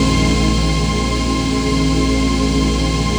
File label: PAD, Dance Mega Synth